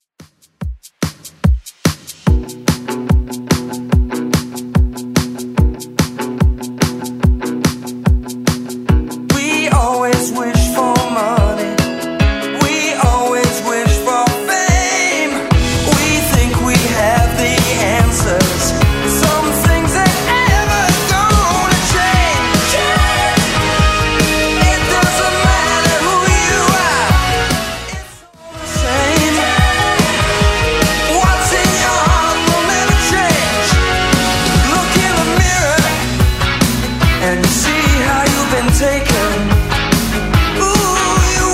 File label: 80s Redrum 145bpm